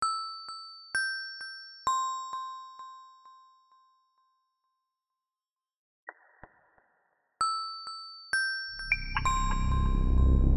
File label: RINGTONE